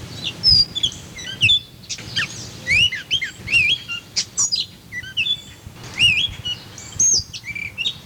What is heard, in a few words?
purple finch